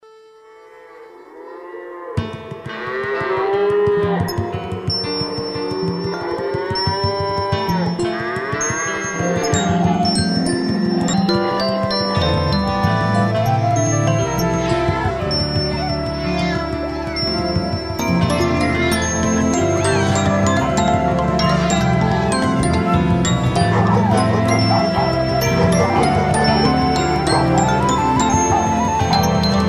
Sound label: Farm Voice